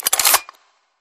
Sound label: coin Wings; hiddencoinsofegypt